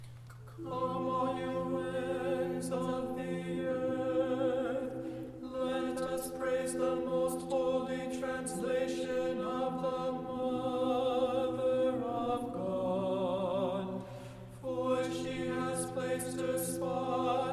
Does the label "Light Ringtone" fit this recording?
No